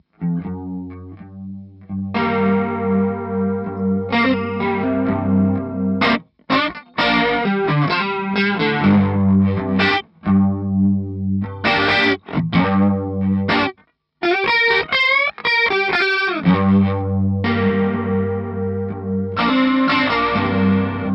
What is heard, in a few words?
lead C2, Guitar compressor